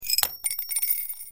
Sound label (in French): Verre